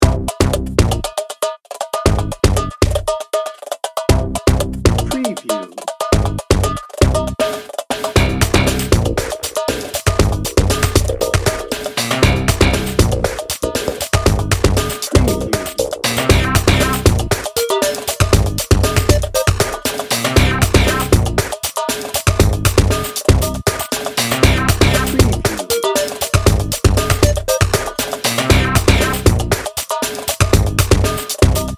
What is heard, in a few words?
industrial innovation bed